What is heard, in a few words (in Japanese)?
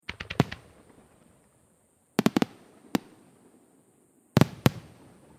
花火の音 連射; firework